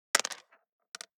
DICE on Plastic, Throw and Roll, Standard, 2 Two Dice; Samples; ArticulatedSounds; DICE Sfx Party (Extended)